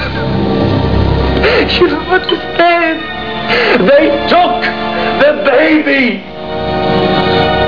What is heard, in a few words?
baby